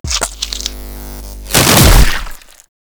Infested Ancient Melee Mid Spin Flesh

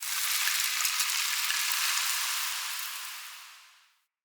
Resource Pickup Embers

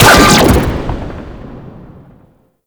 Combat Pod Rocket Launch; Warframe; Vehicles

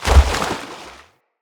Gallop Water, Animals, Foley, Steps; Bromius

